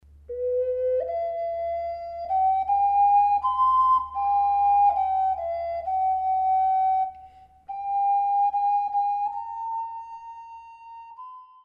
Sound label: ocarina